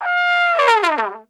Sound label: game over